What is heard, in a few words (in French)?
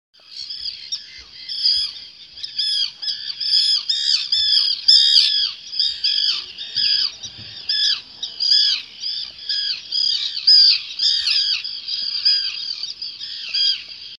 sterne pierregarin chant